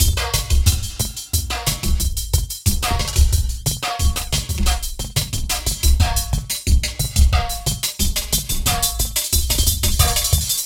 squarechops 090bpm, downbeat dance grooves; Zero-G

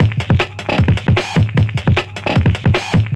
drums, Hardcore Breakbeat 152 Dmin; Big Fish Audio; Hardcore Breakbeat Action; Gone Insane

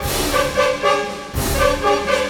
Orchestral Loops

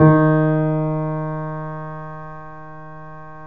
3D Pianos Yamaha C7; E-MU Producer Series Vol. 5

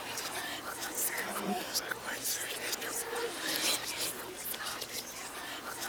WHISP REV, whispers

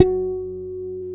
GUI HRMFLA, Acoustic, Roland

